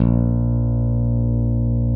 STICK, GUITAR FX

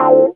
DM ORG1, Dance Mega Synth

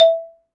XYLOPHONE, Percussion; ILIO

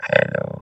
VOX VOX, Electro Vox

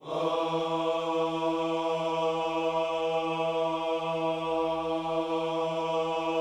WHOA E3, gospel; Spectrasonics Vocal Planet

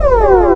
NOISE; USB Soundscan vol.51; House Side Of 2 Step; AKAI; NOISE FX

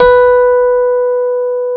B 3, Electric Pianos; Wizoo

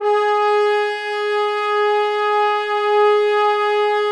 French Horns, G#3

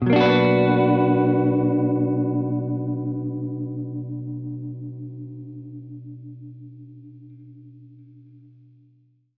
Gretsch Chord Bmin[maj7], Guitar Chords; undercover samples

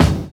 60 S BEAT; Univers Sons - Kitch 70s

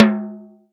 Spok Tom; Roland SPD-S

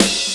Fill, Drum N Bass Percussion Fills n Breaks; Sonic Foundry (Sony Creative Software); Knutrix Drum & Bass

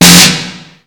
k1, Kawai K1 Drum Machines; 300 Drum Machines

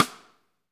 SIDESTICKS; Spectrasonics - Backbeat

